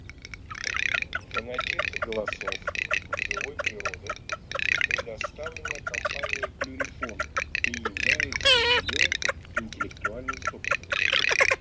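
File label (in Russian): Чирок-трескунок Marzaiola, манок; plurifon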